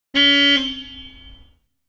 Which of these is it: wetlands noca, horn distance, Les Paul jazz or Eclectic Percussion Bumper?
horn distance